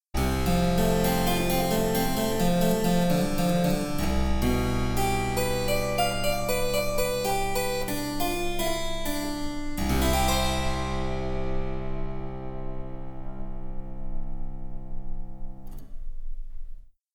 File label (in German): Cembalo; Mark Lindley WTC 1